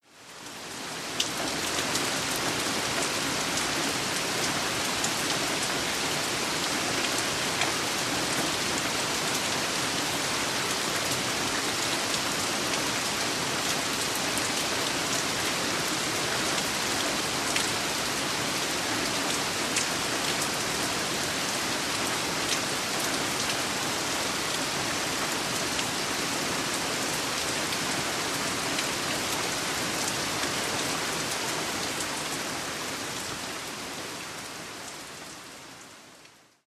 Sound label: Rain